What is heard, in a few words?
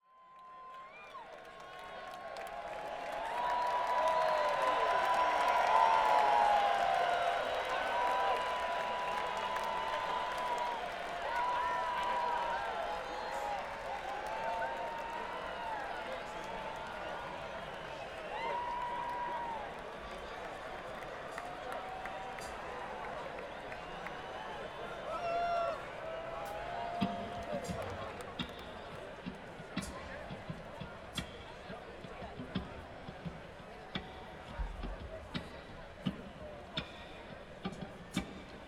Crowd; Commerce City, CO - Dicks Sporting Goods Park